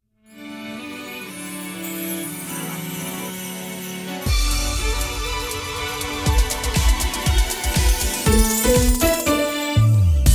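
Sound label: Sung Jingles Radio Xmas 120bpm D Major Ramp; KJOL Radio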